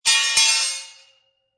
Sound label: Metal Drop On Concrete And Ring, Impact, Metal Dropped On Concrete, Crash Ringing After Impact